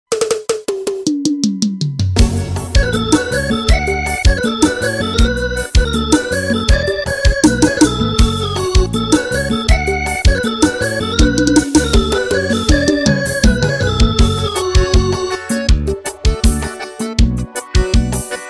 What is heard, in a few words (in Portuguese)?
forro